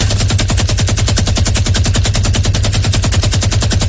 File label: machine gun; Crash Bang Boom